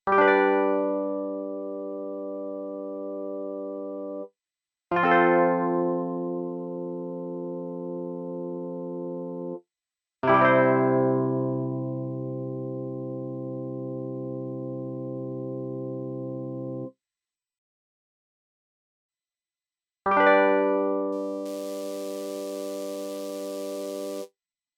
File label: Wurly Loop